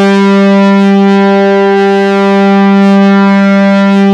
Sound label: MOOG BS G4; MINIMOOG; Greytsounds VOL-1; MINIMOOG 2